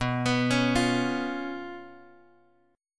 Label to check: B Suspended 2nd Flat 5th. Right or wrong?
Right